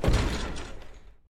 Garage Door Shut; Lethal Company; Windows

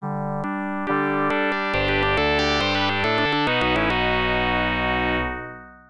Area Clear

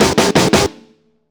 Fill; Sly Cooper and the Thievius Raccoonus 2010 PS3; Libral Fill

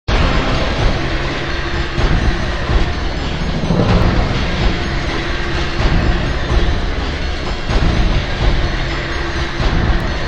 Battle Church; F.E.A.R. Extraction Point

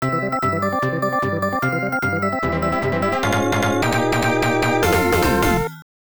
Bonus Stage Clear; galaga 88 namco system 1 arcade

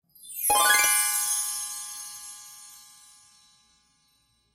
Magic Sound; Five Nights at Treasure Island 2020 Remake; windows

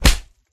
Comedy Cartoon Clap; ProSoundCollection v1 3

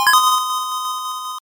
Unused Jingle; Kids Communication Pico

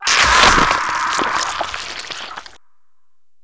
Party Crushed; Legend of Grimrock 2012

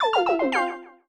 Outro Jingle; Topic Outro Jingle

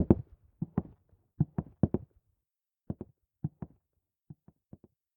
WALKINIG MANNEQUIN WHEN MOVE

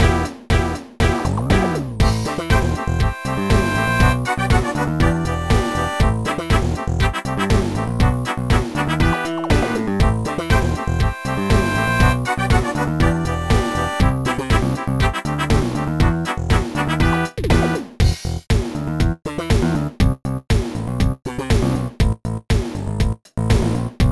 (Instrumental)

